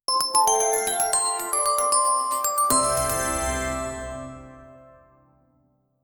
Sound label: short podcast intro sound